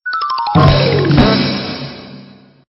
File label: Stinger; STINGERS